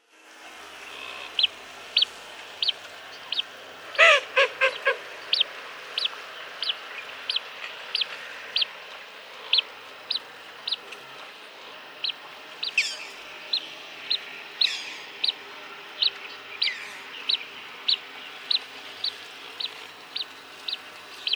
blue-winged teal; paul marvin

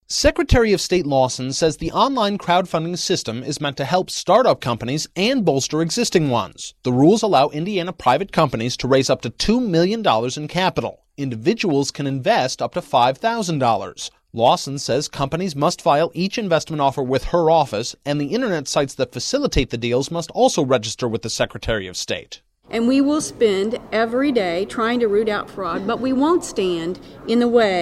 crowd

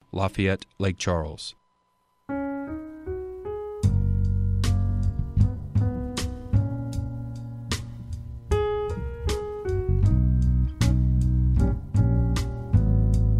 jazz

